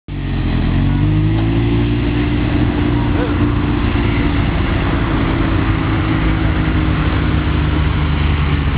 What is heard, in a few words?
Ferrari 308 GTB Sound